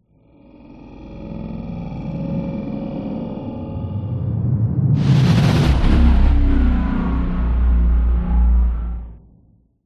sound design whoosh horror growling into heavy compressed whoosh then resonating; drone; zapsplat